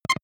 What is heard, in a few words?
button, signale; soundpool